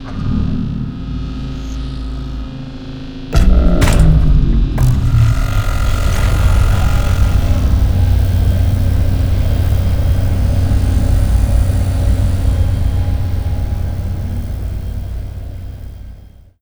star eater opening; Stellaris; nemesis

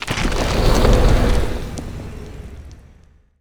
necroid moving out cruiser; Stellaris; necroids

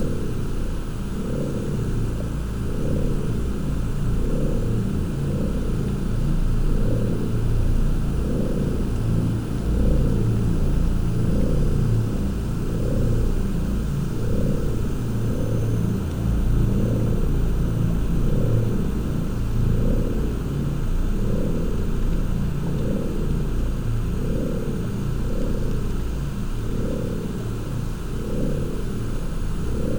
ship transport ship idle; Stellaris; toxoids; ships

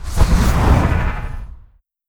moving out titan; apocalypse

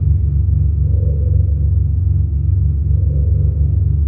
technosphere moving; Stellaris; guardians

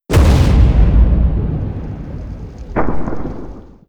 explosion; Stellaris

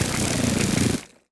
weapon spitter gun; Stellaris; grand archive

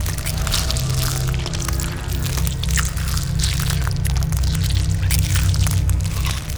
kaiju egg ambience loop; biogenesis

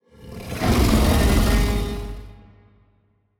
kaiju adult rage roar; biogenesis

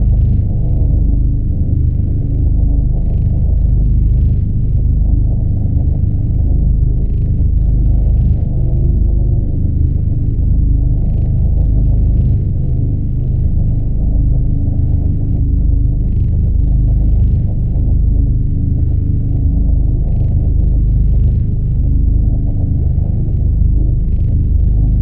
starbase hum layer; apocalypse

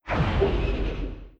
distance energy torpedo fire, weapons; Stellaris

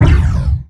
laser fire, weapons; Stellaris

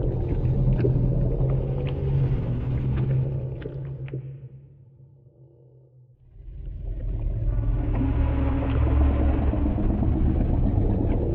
void worms troika idle; Stellaris; grand archive; ships

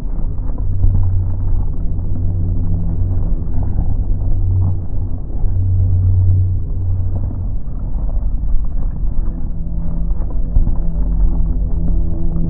amb starbase citadel; necroid; Stellaris; necroids

